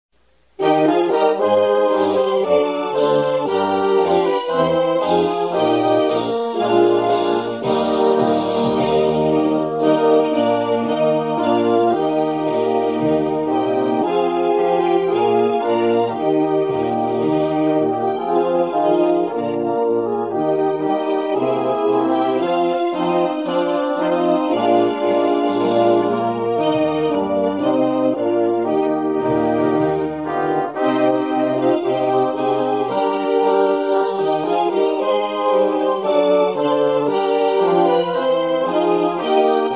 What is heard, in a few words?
Hymn Instrumental